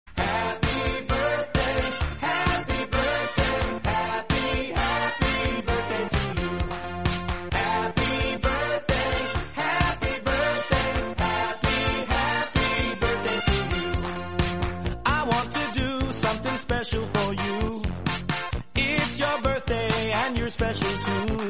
HAPPY BIRTHDAY RINGTONE; DISNEY

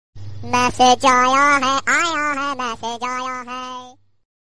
FUNNY NOTIFICATION RINGTONE

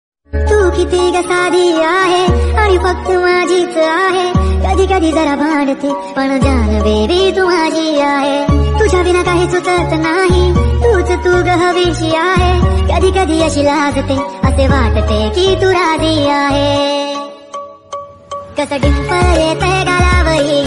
Marathi ringtone

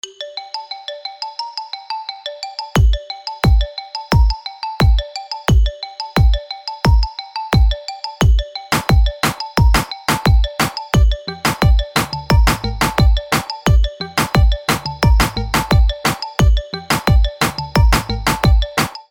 sony xperia sp sms tone ringtone

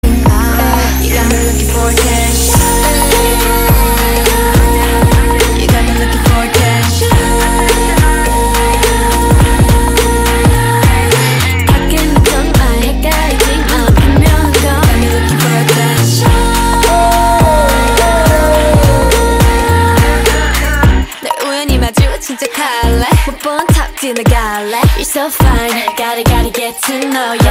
REMIX RINGTONE

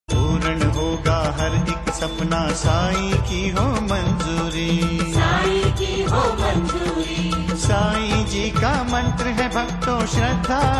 RINGTONE

